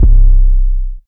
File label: 808 C#; BANANA SPLIT